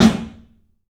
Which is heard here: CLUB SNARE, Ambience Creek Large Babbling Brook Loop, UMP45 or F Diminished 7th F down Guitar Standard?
CLUB SNARE